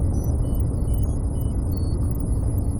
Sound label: turret pod, ambiances; Cosmic Rage